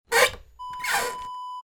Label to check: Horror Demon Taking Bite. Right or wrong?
Wrong